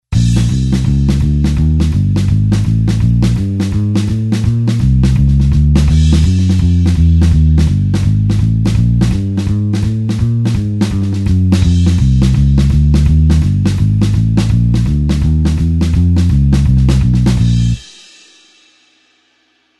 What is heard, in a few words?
blues bassline 4beat Bb line